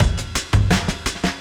Beat Style E 170, breaks; 170bpm

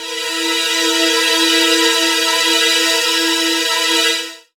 String Mood; Zero-G Jungle Warfare 1, 2 & 3; Strings & Things

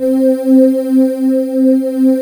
DIGI MOOD C4, PADS; East West - Global Sounds; SD1 PADS

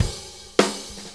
Blues Shuffle, Drum Tools; Sonic Foundry (Sony Creative Software); Loops & Samples Drum Tools

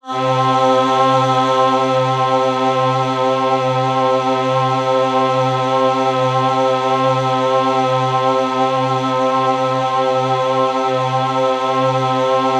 C4, Synth Line; 90 s Sample CDs; NiceBeats; SynthLine VOL-32

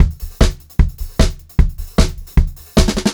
152 SPEED HH, Pop-Rock Drumloops; USB Soundscan vol.37; AKAI; 90 s Sample CDs

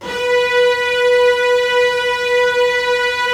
STR SEC, STRINGS; Roland - LA Composer vol. 1

